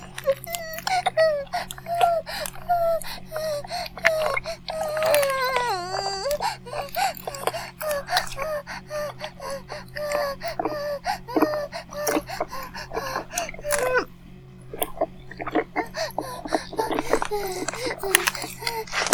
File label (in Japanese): バイブ放置, ASMR